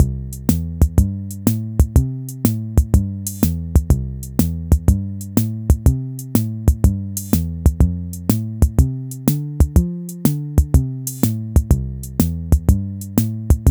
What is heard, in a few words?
Shuffle drum and bass beat loop 123 bpm